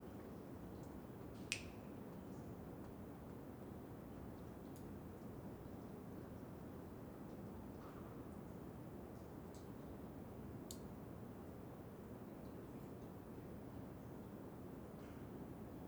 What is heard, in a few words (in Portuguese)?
Gotejamento dentro da caverna angelica com rio e grilo ambos bem longe; agua